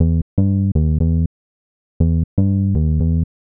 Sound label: Smooth Bass (120BPM), retro house